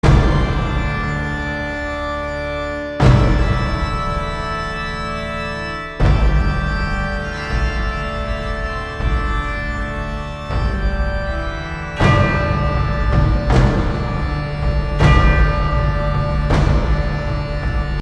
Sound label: gothic loop